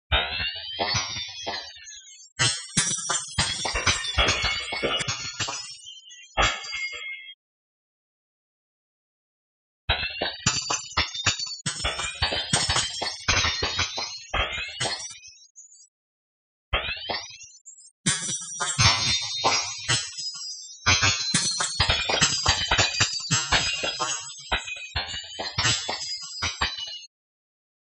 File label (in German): Scherben; Csound; harmonia